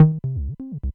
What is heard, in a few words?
fastsong